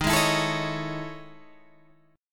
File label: E Major 13th chord